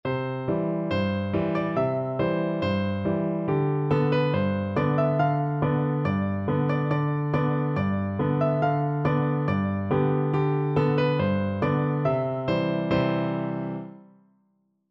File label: tom tom pipers son piano duet